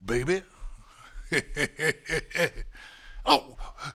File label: Funky Mama Vocal, 70s Analog Funk; The Funky Mama; Sonic Foundry (Sony Creative Software); What it Is! 70s Analog Funk